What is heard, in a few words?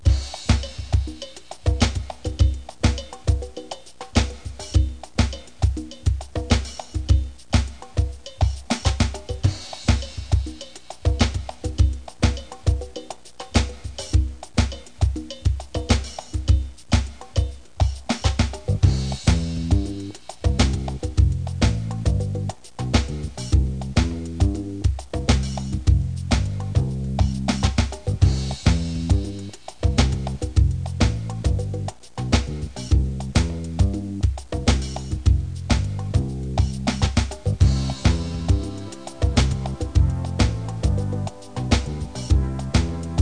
reggae